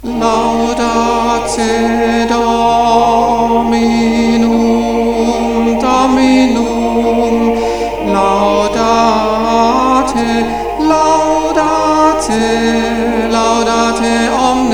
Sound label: Laudate Dominum Tenor; St Martin Ehrenstein; Quempaschor